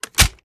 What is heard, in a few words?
cover close, mg3; tacz; daffas arsenal